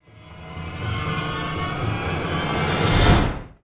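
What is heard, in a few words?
sting, strings smooth fade in; transformers war for cybertron gamerip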